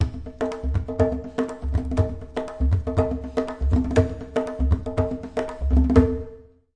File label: epic congas, drum loops and breaks